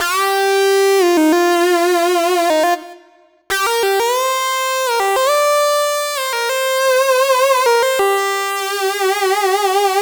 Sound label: Lead Synth Lick; Dr. Fink's Funk Factory